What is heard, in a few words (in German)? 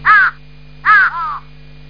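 Krähe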